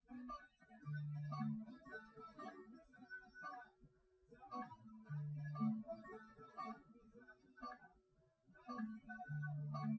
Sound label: nodrums liverec 250ms 1m sim; SiSEC08; SiSEC underdetermined